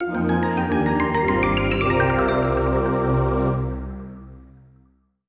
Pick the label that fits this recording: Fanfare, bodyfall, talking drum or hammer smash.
Fanfare